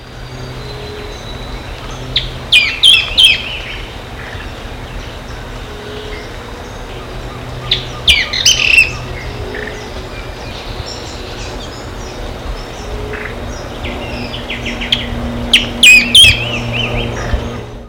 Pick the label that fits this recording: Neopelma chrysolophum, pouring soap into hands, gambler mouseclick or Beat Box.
Neopelma chrysolophum